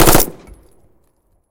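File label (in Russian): пристрелочная прицельная пулеметная очередь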